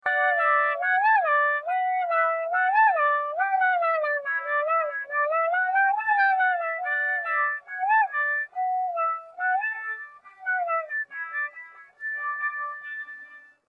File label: ringtone no vocals; mikuringtone